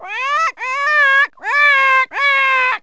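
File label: bird crash; animals; Wired for Sound Pro CD; Aristosoft, Inc.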